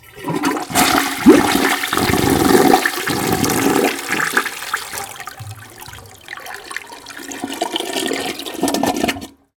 toilet flush; Golden Crayon Sounds

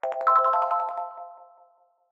store order complete; Original TK Sounds

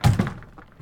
door close; Golden Crayon Sounds

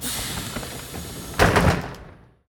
door open; Golden Crayon Sounds

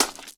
gravel step; Original TK Sounds

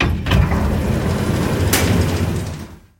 elevator open; Golden Crayon Sounds

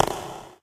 cave grounds land; Golden Crayon Sounds

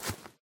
grass step; Golden Crayon Sounds

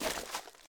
debris step; Golden Crayon Sounds

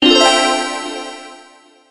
win challenge; RS Games Sounds; I Doubt It

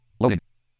Web Page Loading In Progress; ESAN VO Sound Schemes; ESan Eloquence VO Sounds Pack

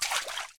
water step; Golden Crayon Sounds

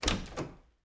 door open; Golden Crayon Sounds

